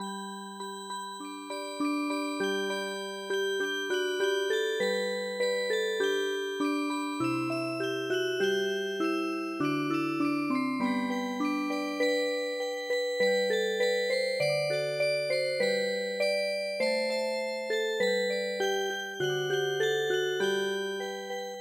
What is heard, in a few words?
Music Box